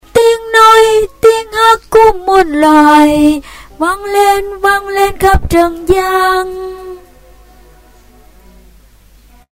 alto

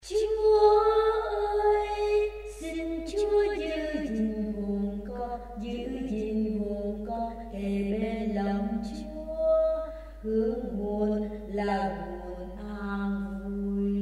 alto basso

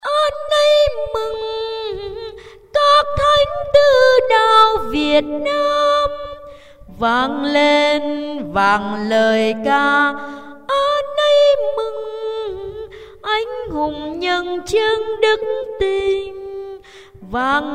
basso

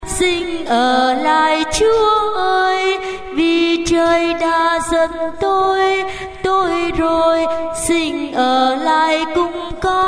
basso

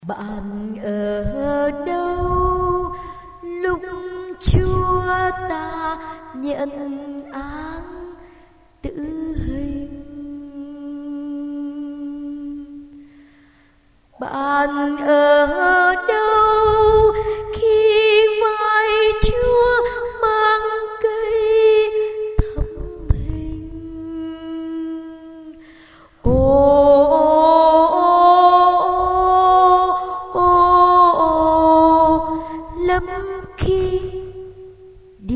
basso

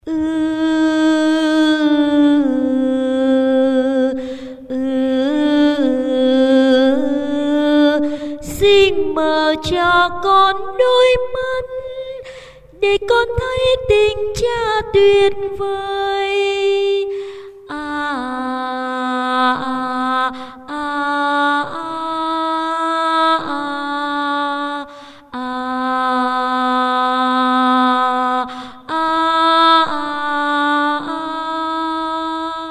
alto

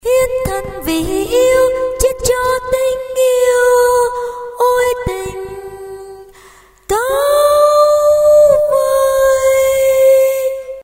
tenor

